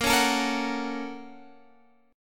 Bb Minor Major 7th Sharp 5th, Guitar, Half Step Down; BbmM7#5